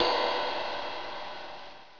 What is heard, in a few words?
ride